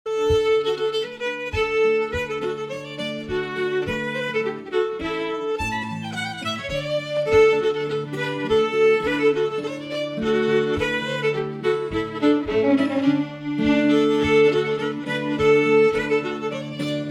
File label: Polska Middle